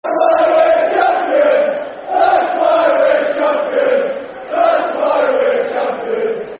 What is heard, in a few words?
That's Why We're Champion's Football Chant (Soccer Songs); Scunthorpe United Scfc